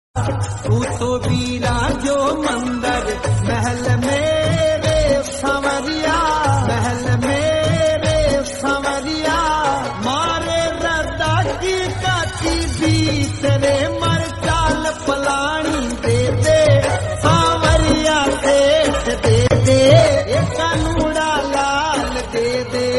bhajan, marwadi

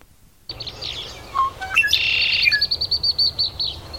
redwing blackbird

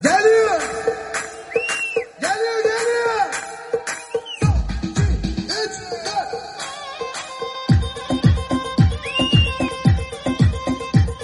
instrumental music

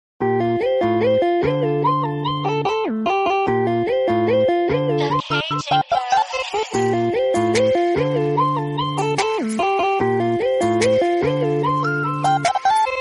tiktok instrumental

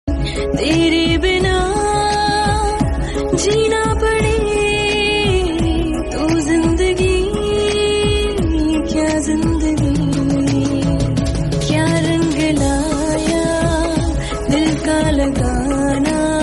female

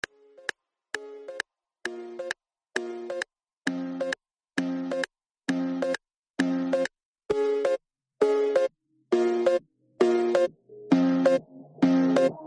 intro bgm

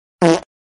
fart notification funny

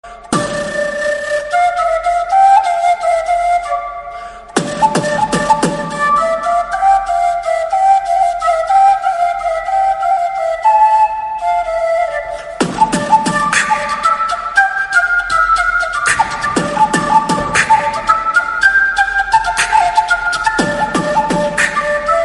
beatbox flute; naruto

